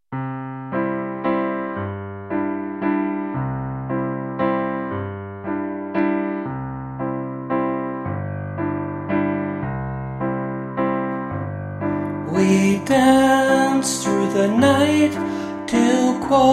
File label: band example